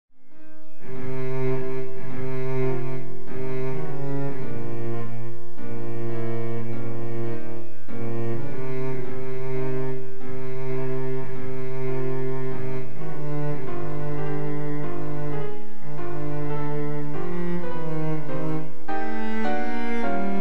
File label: car b nejiro, bass